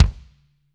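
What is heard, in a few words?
bass drum; Bob Clearmountain Drums I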